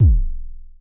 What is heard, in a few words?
Mild Thump Kicks E0, Vermona Kick Lancet; LookIMadeAThing Kicks; M8; Mild Thump Kicks Samples